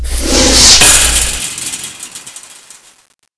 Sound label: fence climb